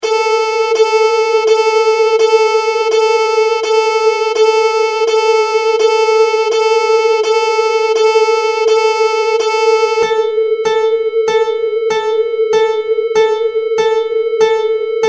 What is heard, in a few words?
Annoying Instruments, csound